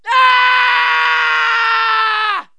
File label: pain yell, cartoon; KABOOM! - Wild and Wacky Sound Software; yells